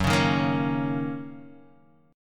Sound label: Gb 6th, Guitar, Standard; strummed; 2,4,1,3,2,x; JGuitar